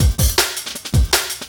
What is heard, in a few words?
Classic Beat, Drum N Bass